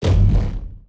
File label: javelin